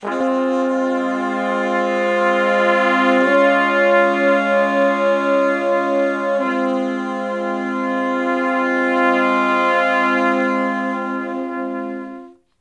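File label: Slo Minor Blus Chords (I,IV,I,I) (F), horns; Sections; Crimson Blue and Fabulous Horncraft 4 RnB; Horncraft for R&B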